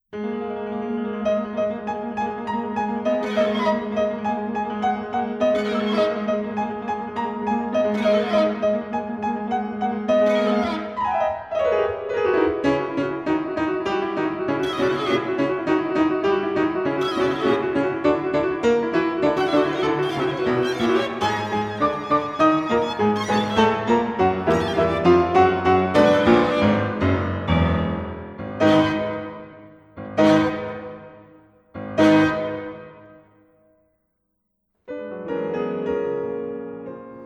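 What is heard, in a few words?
violin sonata; janacek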